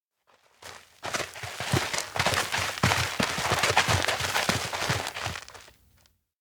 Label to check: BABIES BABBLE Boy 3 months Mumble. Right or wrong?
Wrong